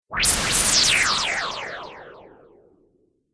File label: Laser Swish Delay, whoosh sound effect